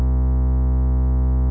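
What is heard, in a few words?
ambient light humm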